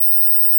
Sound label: PeakSlope D#3